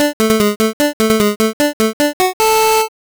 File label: jingle classic schubert; guntaikoushin